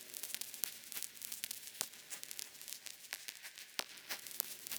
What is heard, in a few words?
Cracklature, sparse soundscape